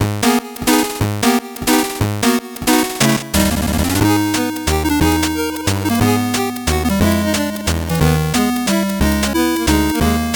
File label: 8bit bgm normal